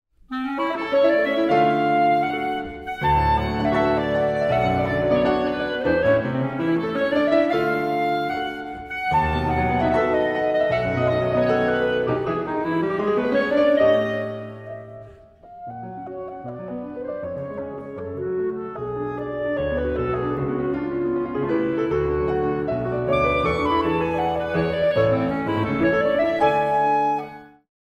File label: rasch und mit feuer, romantic clarinet